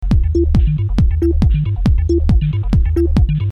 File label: Hardhouse loop